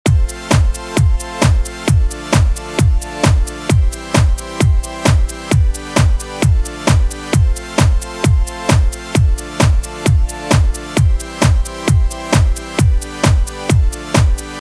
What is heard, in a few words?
Sidechain Rave (loop)